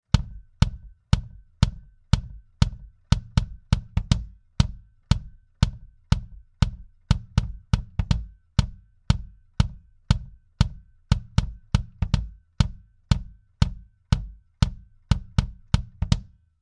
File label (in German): API Audio 2500 Bass Drum 100 Prozent Kompression